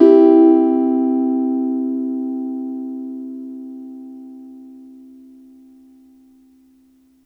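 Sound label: Piano Clav major soft; TrashLibrary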